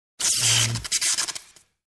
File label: Electrical shock